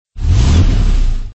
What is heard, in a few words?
Red Rev Short; Cars